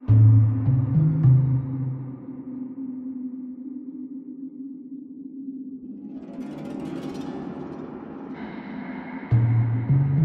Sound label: Suspense Stinger